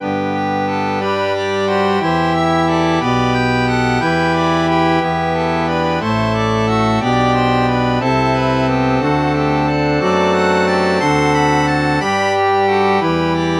reed organ

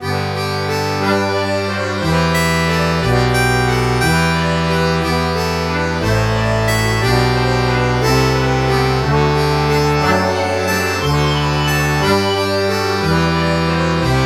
bandoneon

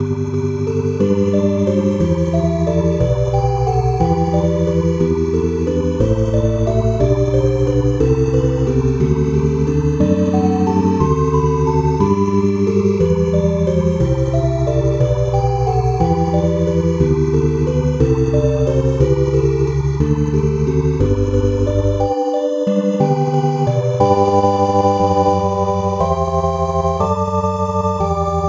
bottle chiff

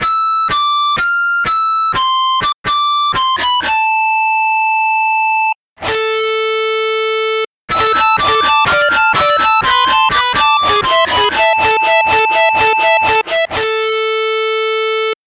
guitar harmonics

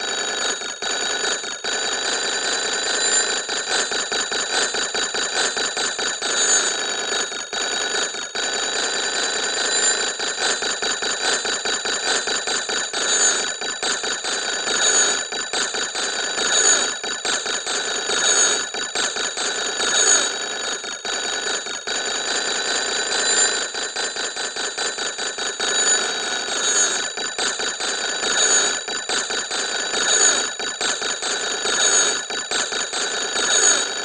telephone

